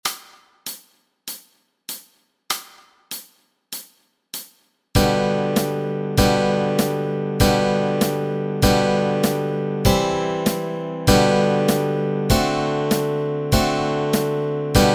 Up on the Housetop chords, Easy Guitar and Ukulele